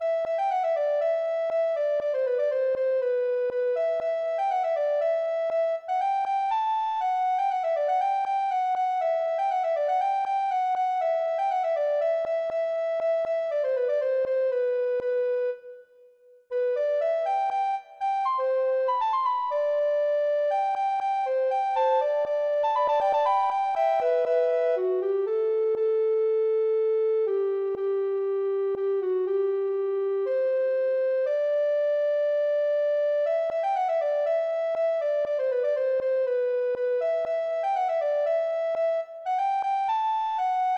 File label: ocarina